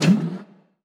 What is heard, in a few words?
Aircrafts Engines Packard Merlin Prop; DCS